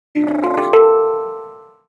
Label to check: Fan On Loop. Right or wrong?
Wrong